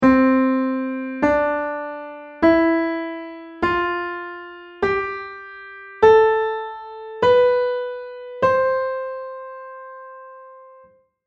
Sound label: Gt c major scale